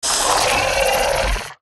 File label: Scarab Beetle Play